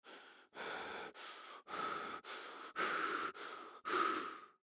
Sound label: paniced breathing